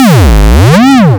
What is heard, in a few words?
Basse, techno acid